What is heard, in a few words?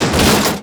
bump, sled; WhiteOut